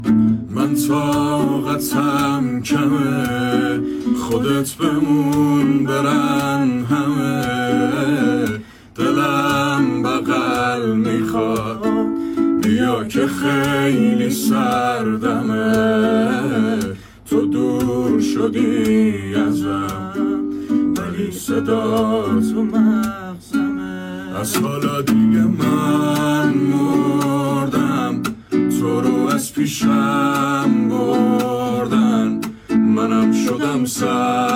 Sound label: guitar